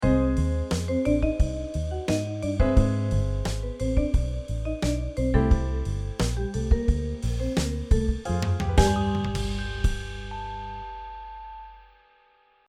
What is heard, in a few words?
loop